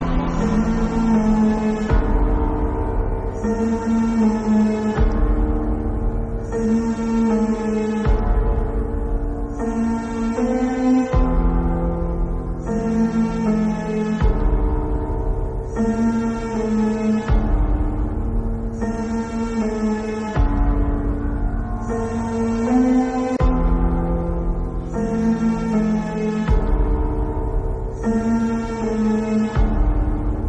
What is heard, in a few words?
bgm